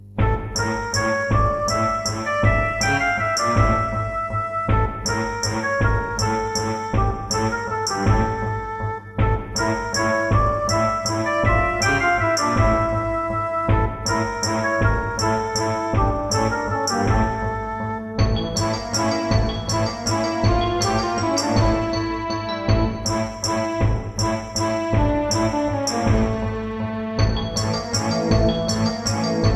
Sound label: Creative Wave Blaster II